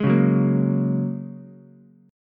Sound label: A sharp Minor 7th Sharp 5th, Ukulele; strummed; JGuitar